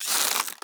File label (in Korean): 일반 활 당기기